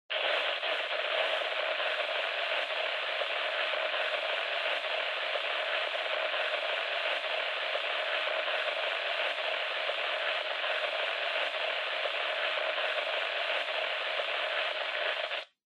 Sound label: Handheld Transceiver Noise Long